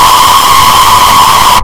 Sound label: withered mangle jumpscare